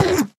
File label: hit, endermen; ayuncraft-1.5